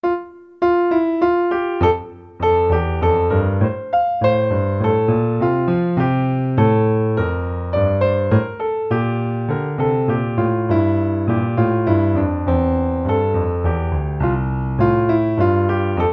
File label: Canon in F, piano